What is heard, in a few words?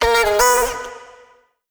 phrase Abm, Vocal; Slate Digital Omnivox Vocal Sample Pack